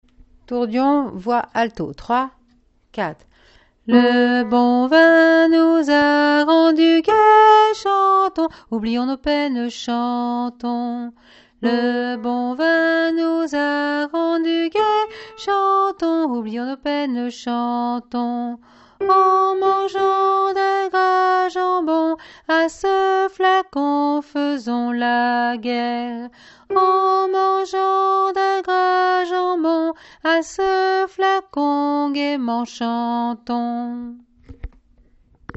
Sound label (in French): alto; tourdion